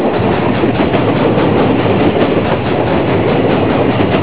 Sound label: TRAIN